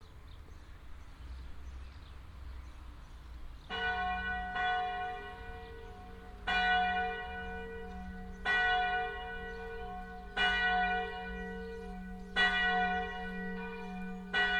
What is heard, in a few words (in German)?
Glocken Lensahn, kirche